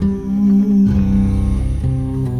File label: Guitar And Synth; Sonic Foundry (Sony Creative Software) - Friendly Fire